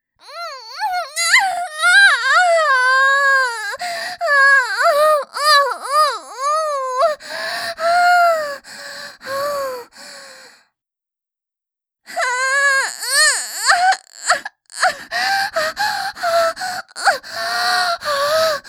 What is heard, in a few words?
High anime moans